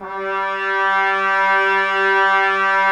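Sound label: brass trumpets; Miroslav Vitous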